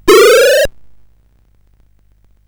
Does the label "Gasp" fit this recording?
No